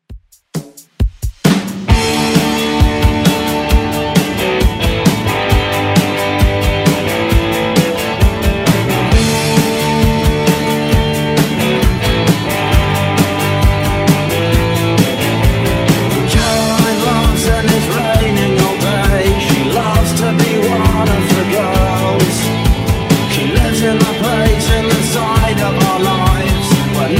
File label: 80s Rock Redrum, 133 bpm